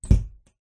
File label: household door cupboard built in close inside cupboard pov